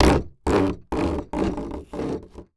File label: Foley Wood Scrape Across Cement Floor Sporadic